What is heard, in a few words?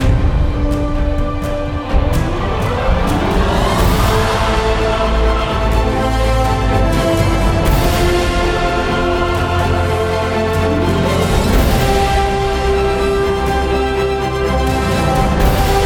Pirate Ship Reveal; Disney Infinity